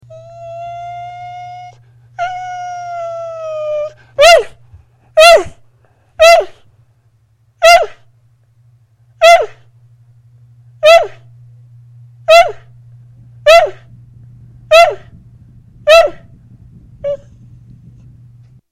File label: crying